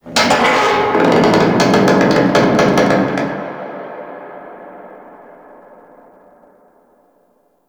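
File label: Ice Metal Distant, Ambience; Warframe; Corpus Ice Planet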